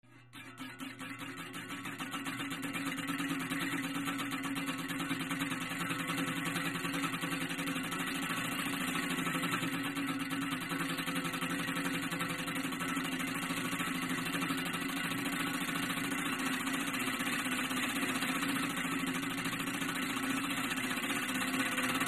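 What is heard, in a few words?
Small Fan Blade Running, variable speed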